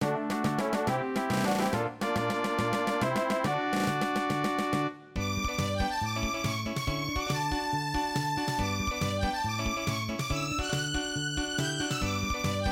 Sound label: bgm